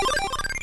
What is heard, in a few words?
coin; neogeo